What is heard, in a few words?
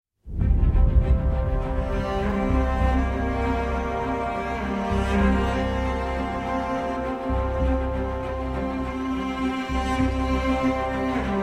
Instrumental